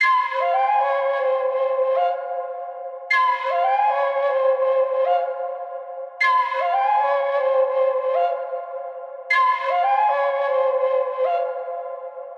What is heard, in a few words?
flute, 155 BPM F Min; Cymatics; Domination